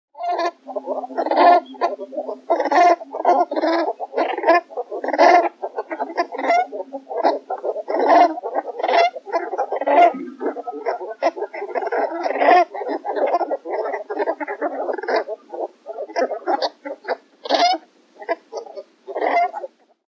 Ringelgans Distanzrufe; uni-kl

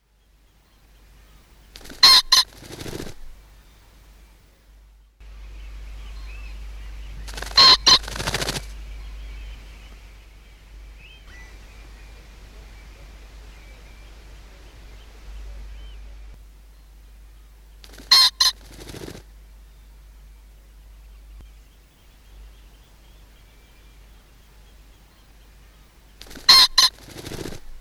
Fasan Gesang Fluegelschwirren; uni-kl

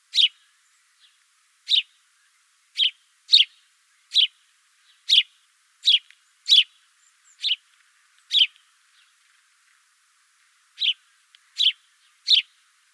Haussperling Tschilp Gesang; uni-kl

